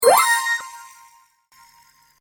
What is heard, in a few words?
Multimedia Alert Sound Effect, Medium Length, Chime; Chimes (Sound Effects:Multimedia); Stuart Duffield